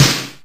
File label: Snares; Vengeance Samples